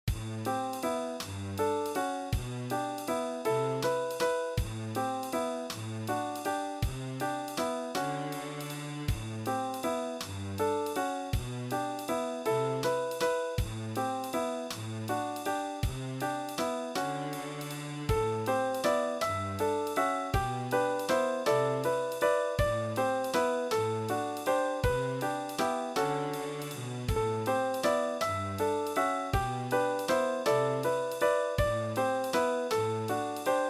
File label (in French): en avant blonde batterie jazz